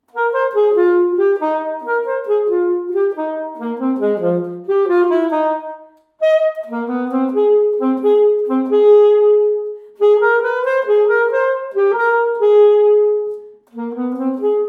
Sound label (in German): Saxophon; Entertainer